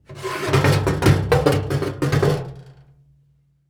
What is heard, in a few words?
Impact Garbage Can Drag On Boardwalk